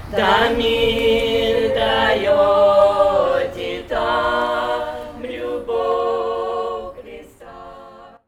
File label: CHOIR